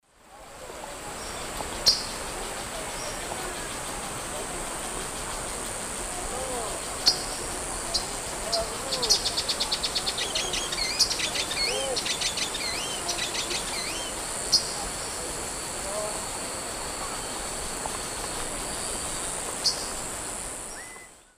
Cissopis leverianus, flight call and songs 2 birds; S. America; Eastern Lowlands